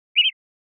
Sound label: chirp